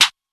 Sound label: Snare, trap drum kit